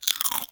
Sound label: bite Sound